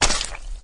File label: walk, flood